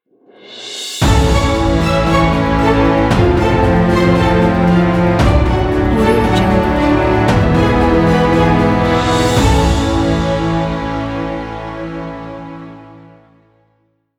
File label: epic blockbuster logo